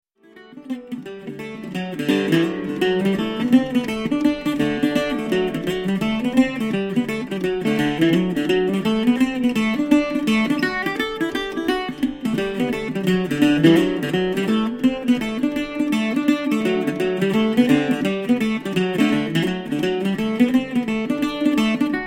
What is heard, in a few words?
octave mandolin